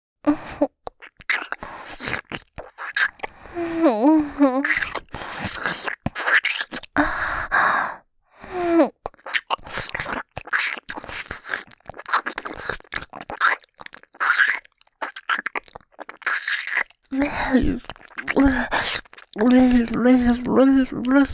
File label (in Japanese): 左耳フェラ; 激あまドエロなママショタ生活♪; 豚骨ウェーブ; バイノーラル